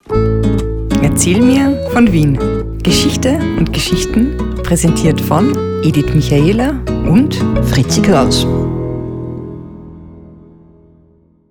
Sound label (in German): Anfangsjingle